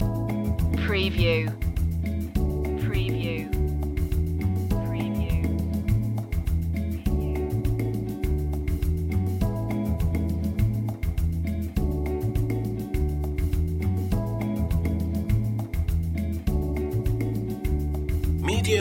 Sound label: happy rhythm loop